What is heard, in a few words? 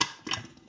mixer open